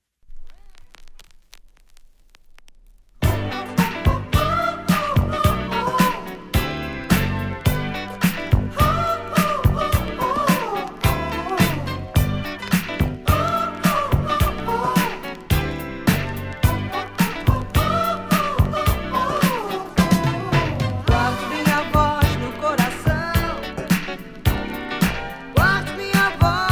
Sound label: Brazilian Boogie, Disco & Funk